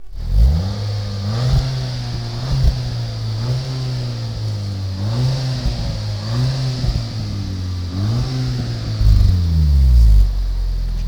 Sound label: Audi A3 8P 2.0L Quattro